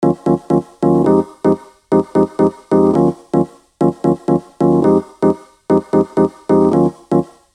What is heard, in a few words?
rhodes loop